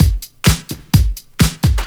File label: Straight Loop 128 BPM, House